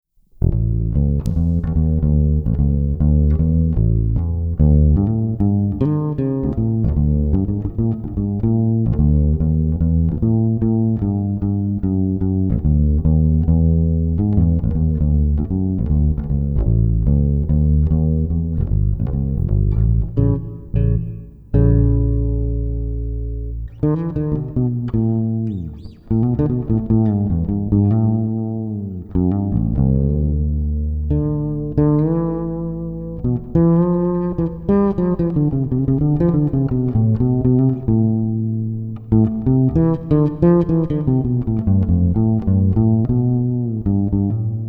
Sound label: fretless